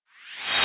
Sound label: Sweep Up Shot 100